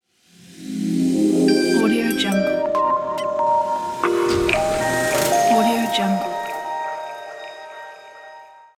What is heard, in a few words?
elegant hitech logo